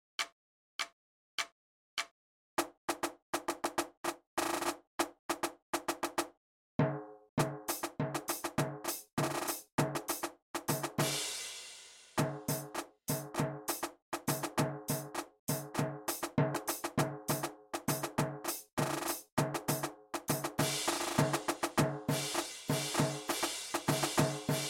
Perkussion

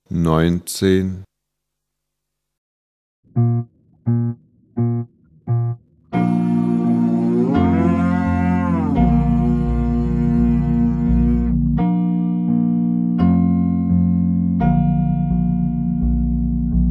Klang, Hornschule

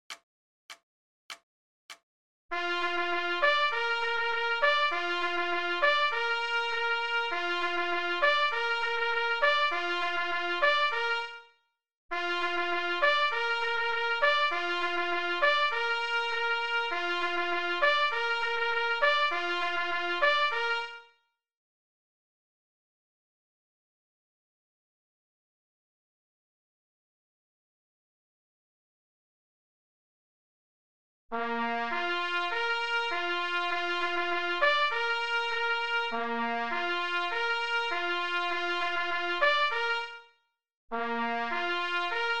Horn; Suttkus-Marsch

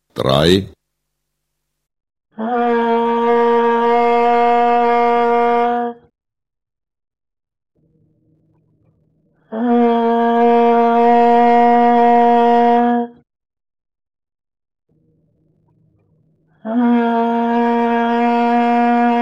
Klang, Hornschule; Ansatz

